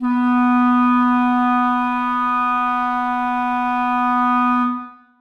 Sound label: clarinets sus b3; Sonatina Symphonic Orchestra